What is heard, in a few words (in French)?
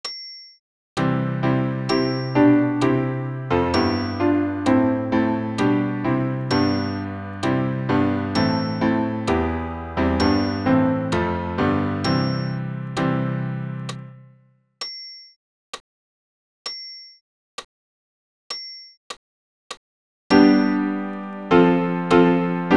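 Credo tous piano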